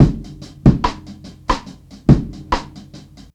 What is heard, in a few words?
burn slow, Boombap, Drum loops